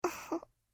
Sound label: giggle